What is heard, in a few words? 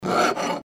filing